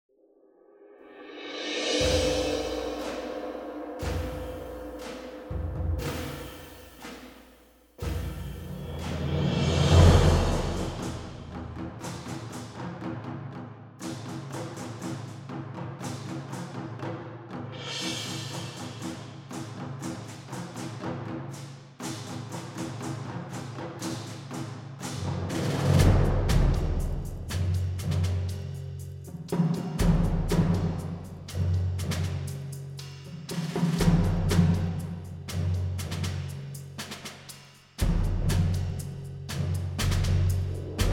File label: action percussion; Dino D-Day; last stand